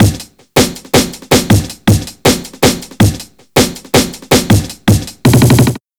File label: 160, drum loops, drum & bass; Total Drum&Bass